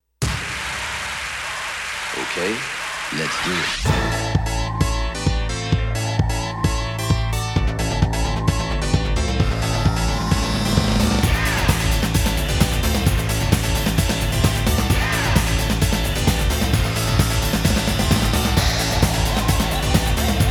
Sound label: techno